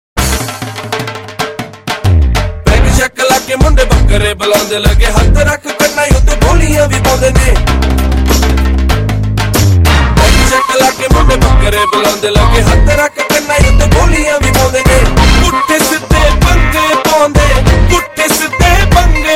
Bhangra

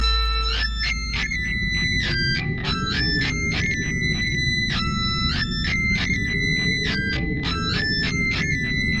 Metal; Stone Tower D

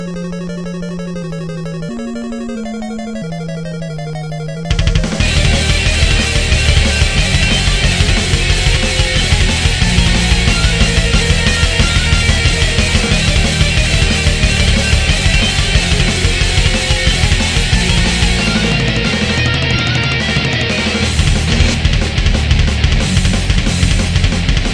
Castle Theme Metal

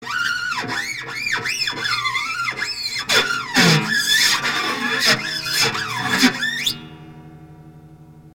Guitar Scratch

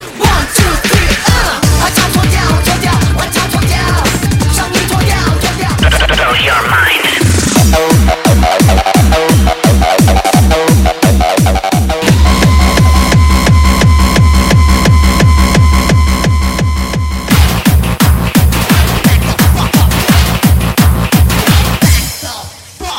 Techmix

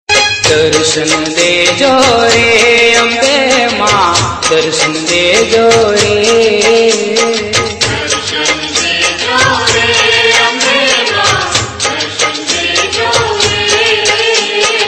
Garba

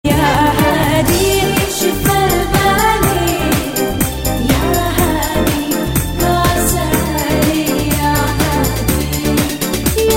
Arab

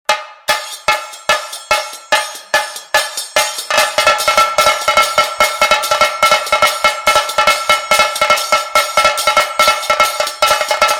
Dholtasha Sound

